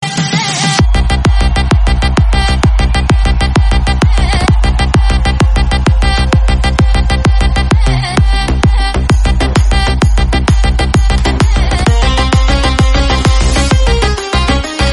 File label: english instrumental bgm